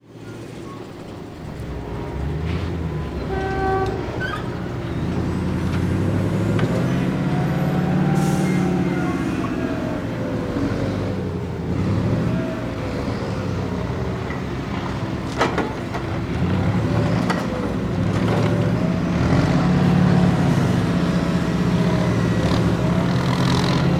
industrial logging loading logs on truck with hydraulic lift; sound effects one